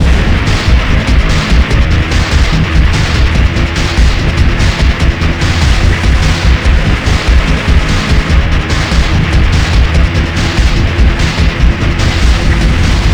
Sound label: chase theme; slender; chaosinsugunner